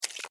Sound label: Spider Web Shoot, Spider, Web, Shoot, Short, Arachnid, Insect, Bug; Spiders; Blastwave; Animals:Insects